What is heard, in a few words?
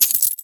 gold up